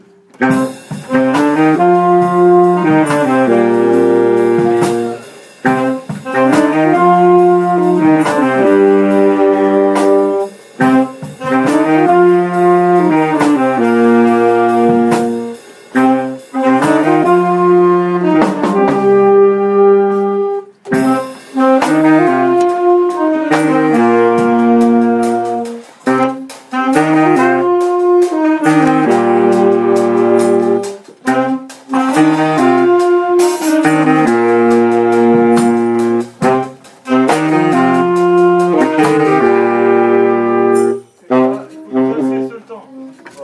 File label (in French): Voix Sax, brass band